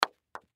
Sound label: Croquet Mallet Hit Ball Into Ball; SPORTS; Blastwave